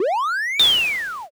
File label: beam cannon